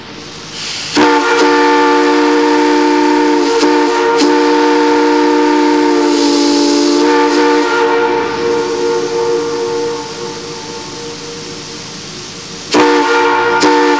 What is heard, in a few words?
3 chime, Steam Whistles; SB B&O 3 Chime (On Air) – Side Mount; We recorded this at Hawks Nest. Wasn’t the best on air, as with any “steam” whistle, but this will do until I get another recording.